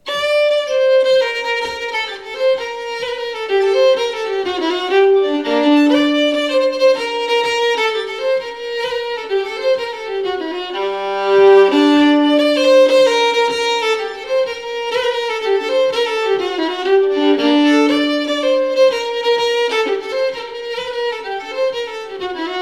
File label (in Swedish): Polska; Härjedalen; Polska e Jonk Jonas EÖ612